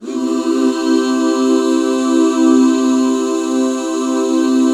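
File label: C major; Best Service Gigapack 1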